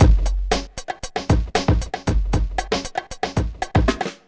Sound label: bongo 116; Zero-G; Funky Elements